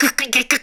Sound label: Lift Struggle; zelda